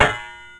clank

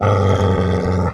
Growl

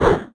Mid whoosh

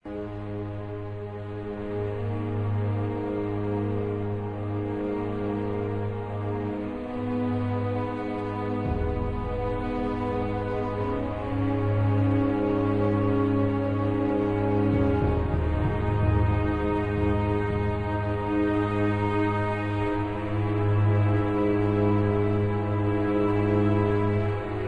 music snow

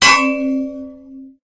Metal Hit

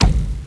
Thud

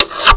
scratch

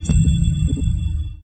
console success

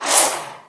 jump

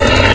Snarl

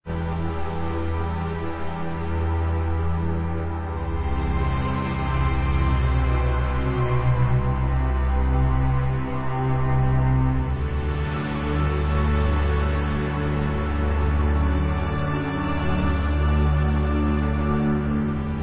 Music Grass

